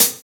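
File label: closed hi-hat, Roland MV8800 drum kit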